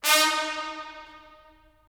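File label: brass trumpets staccato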